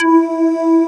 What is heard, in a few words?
Bamboo e5; BGM; Nintendo; July 2020 Gigaleak